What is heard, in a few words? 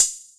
Closed Hat, retro house samples, Drum Hits